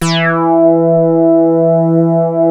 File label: JUNO 60 E4; Hollywood Edge - Giorgio Moroder Rare Synthesizer Collection